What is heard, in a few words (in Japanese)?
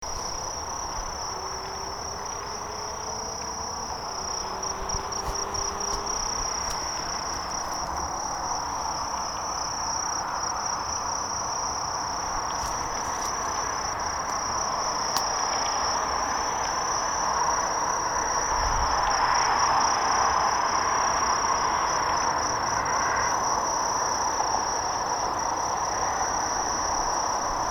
kinhibari